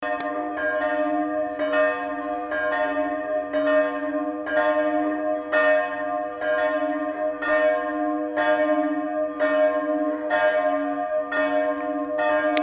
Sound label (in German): Stockum Glocken